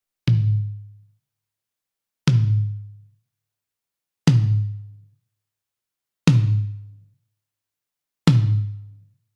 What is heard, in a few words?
TOM1 GROUP